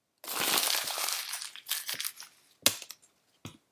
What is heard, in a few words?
Auditory Icons; Paper; oviatt